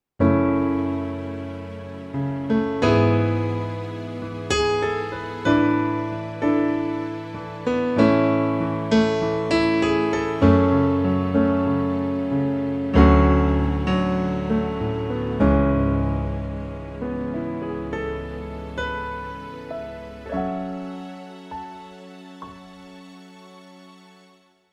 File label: FP-50 Piano Strings